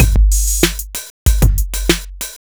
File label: 808 95bpm 1bar, breaks, breakcore; New Breaks